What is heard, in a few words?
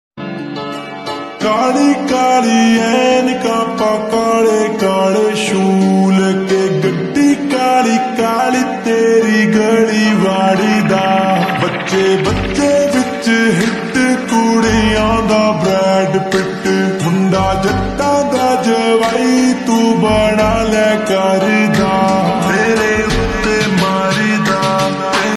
song lofi ringtone